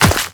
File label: Run Dirt, Footsteps; Warframe; The New War; Characters; Kahl